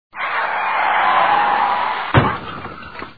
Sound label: car brake crash